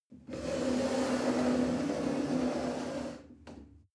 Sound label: chair wood slide on floor soft